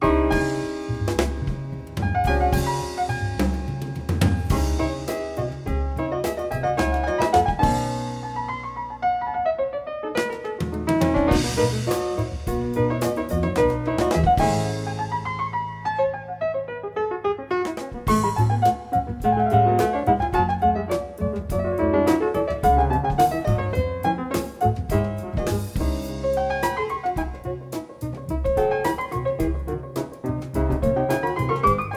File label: Concerto for 2 pianos in C minor Allegro